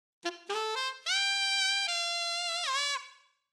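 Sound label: sax pop 112 bpm F major C major B flat major E flat major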